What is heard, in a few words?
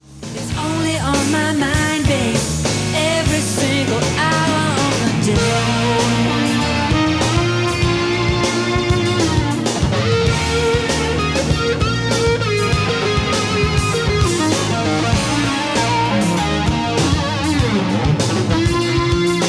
guitar solo